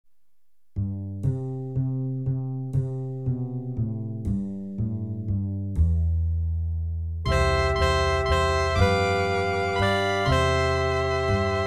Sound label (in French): orchestre